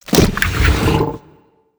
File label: Bloater Expand